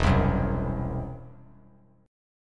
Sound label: E Suspended 2nd Sharp 5th, Bass Standard; Esus2#5 strummed; 0,3,4,x